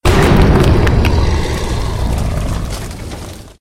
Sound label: Giant trans